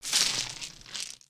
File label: Lego Droppings; foley